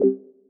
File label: drums conga low; Just Dance 2018